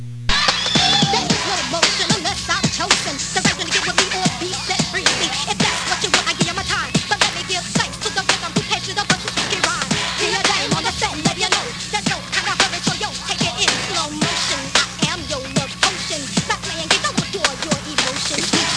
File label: rap